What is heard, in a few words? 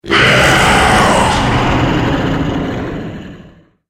The Possessed Demon Spirit, ghost; Ghost Sound Effects